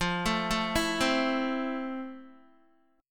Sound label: F Major 7th, Guitar; arpeggiated